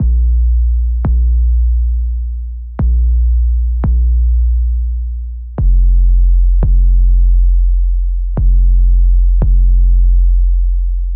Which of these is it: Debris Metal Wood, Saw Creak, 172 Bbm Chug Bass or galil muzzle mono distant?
172 Bbm Chug Bass